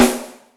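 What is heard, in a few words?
Ambient rim, Yamaha DTXpress Acoustic Snare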